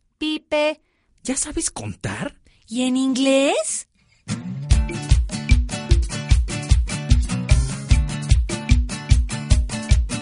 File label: pipe